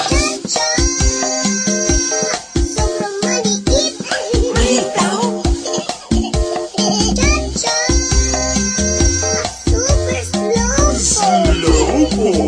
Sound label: Cha Cha